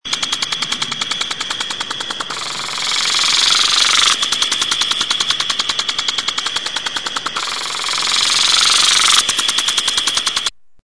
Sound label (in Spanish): riego automatico cesped